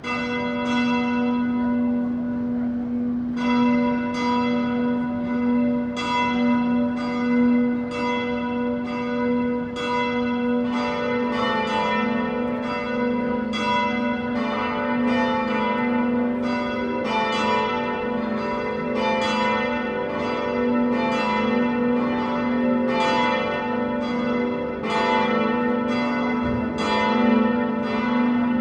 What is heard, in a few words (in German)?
Kirchenglocken